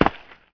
debris; PC Gamer IT CD 41; Daikatana

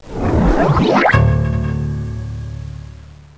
Time Frame Ominous; Let's Discover Michigan!

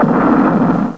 Kaboom; Alpha Battle II 2.0

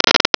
Crack; Grid Warrior 1.2.6 Demo

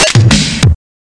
DrumKit

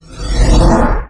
respawn; Forsaken

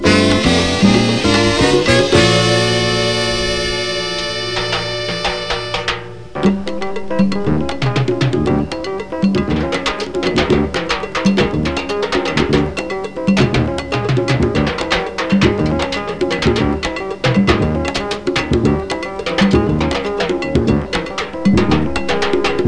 SALSA; Kunsthaus Tacheles; kcafe

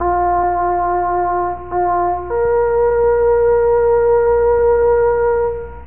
Taps; F⁄A-18 Hornet 2.0

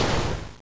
crash

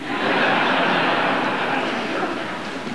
laughter

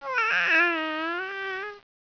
COO

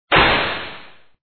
Crash, parking; parking mad

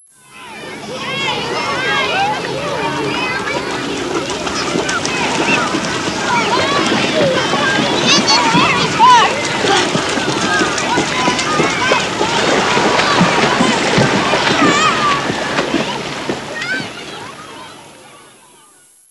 swimming; workshop

